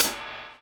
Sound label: DOWN, DOWN KIT 78